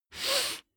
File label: nose sniff male slow; koerpergeraeusche; menschen; soundpool